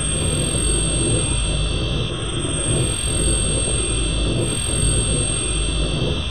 Stone Idle; Seer; GamigoNA

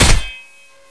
Dmg Axe Hard

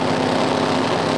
Buggy Car Run; Seer; GamigoNA

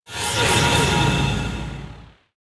Light Field

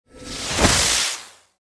imp atk; Seer; GamigoNA

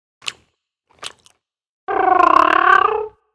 Fox idle

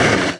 Skel Kinght dmg

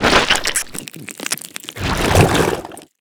Leg Die; Freloan; Seer; GamigoNA

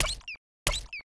Dog Back; Seer; GamigoNA

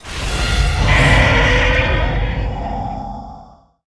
Wave; Leviathan

